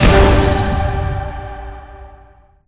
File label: Fuse Levelup; Spider-Man Unlimited gamerip 2014 android ios windows